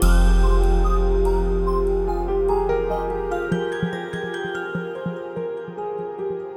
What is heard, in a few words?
Mystery Piano